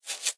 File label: Scratch; smite; kumbhakarna